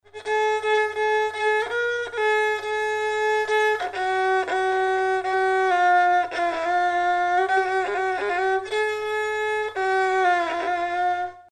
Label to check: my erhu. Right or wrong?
Right